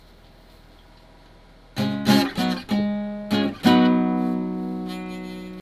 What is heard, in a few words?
music